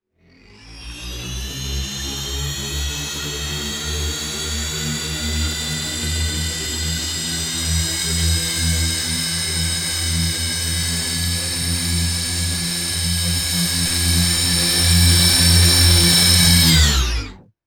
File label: sci fi hoverboard motor accelerating and humming; motors